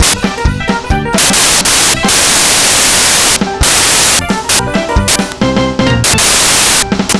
pop, bgm; pictcode

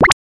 attack; pictcode

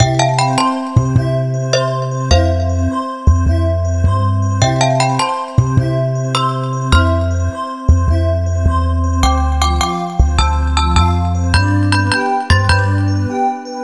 fantasy, bgm; pictcode

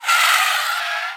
CAR; pictcode

